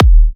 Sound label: D#, Kicks; markus hakala